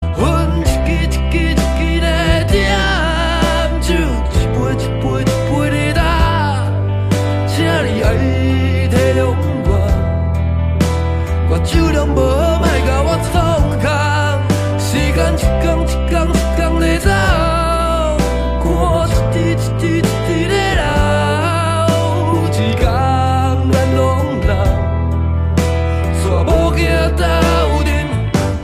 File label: Song hokkien